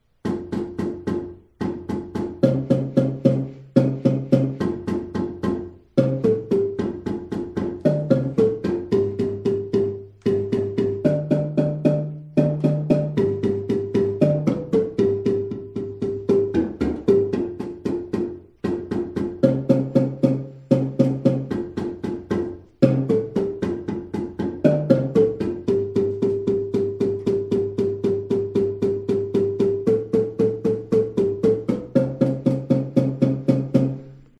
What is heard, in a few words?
bass layering, marimba